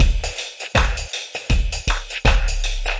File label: klubba dubba, drum loops and breaks, 080 bpm; 000 to 080 bpm